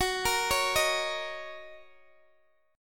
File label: Gb 6th Gb down Guitar Open D